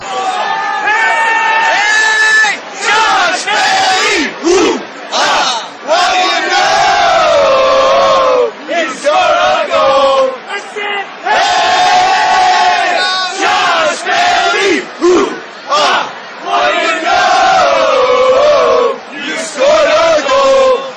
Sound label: hey fan chants; josh bailey